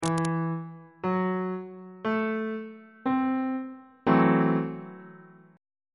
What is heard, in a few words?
g double sharp minor 6th 2nd inversion on bass clef